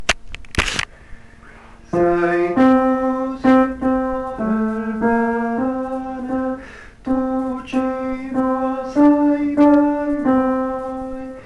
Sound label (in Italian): tenori; Sei tu Signore il pane